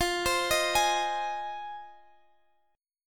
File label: F Minor 7th F Guitar Standard; x,x,15,17,16,16; arpeggiated; capo=3